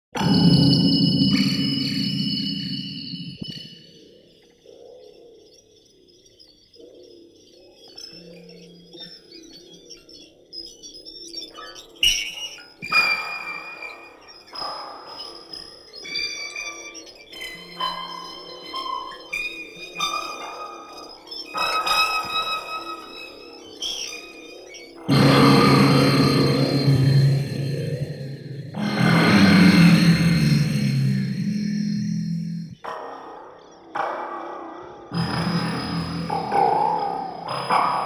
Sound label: siren touch